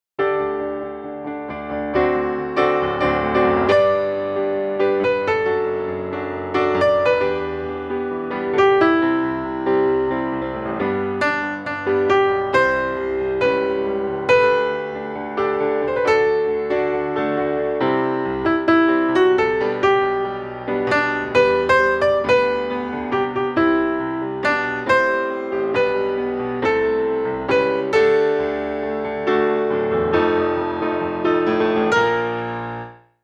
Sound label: FOLK Melody; Kibibi